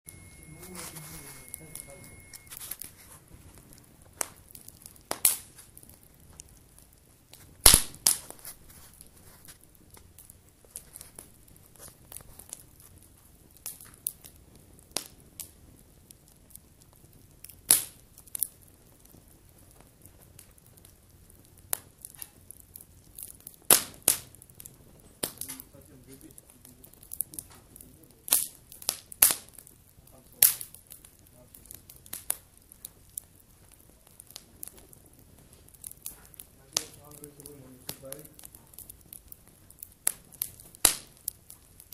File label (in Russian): tresk drov v kostre